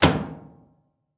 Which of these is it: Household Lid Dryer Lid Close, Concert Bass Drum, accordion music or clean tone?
Household Lid Dryer Lid Close